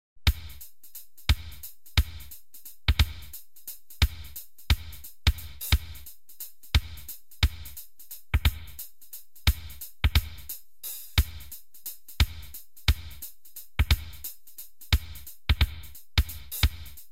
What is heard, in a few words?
Drum solo, mono